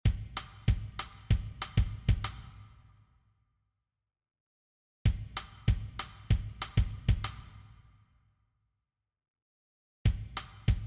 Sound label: Drum Kit